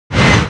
ATTACK

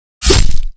ATTACK

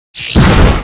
ATTACK

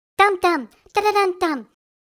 EMOT CHEER

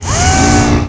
ATTACK

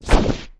jump

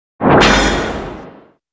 PVP fight

